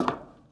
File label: bleacher step; Golden Crayon Sounds